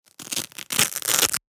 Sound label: Velcro small patch tearing